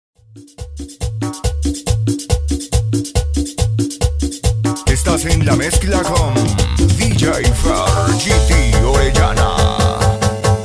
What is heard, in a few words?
MARIMBA MIX